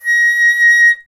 FLT FLTA#, Flute; Miroslav Vitous - Solo Instruments VOL-2

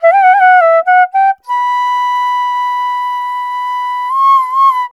INDIA ALTO; Spectrasonics; Heart of Asia; IND ALTO PH

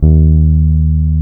BASS D#2, Electric Basses; PlatinumPhattCD2; E-MU Producer Series Vol. 8 – Platinum Phatt

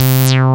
fat fuzzy; Pro-Rec - Synthsonic

